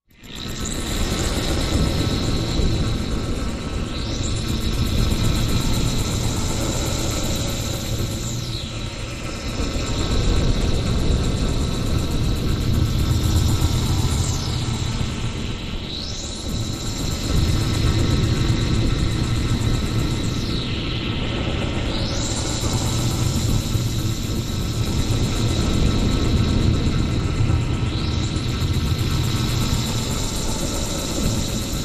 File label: Time Machine, sci-fi ambience